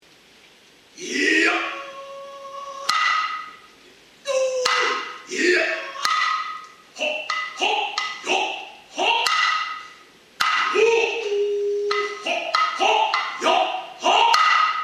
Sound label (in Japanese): otsuzumi neiro, noh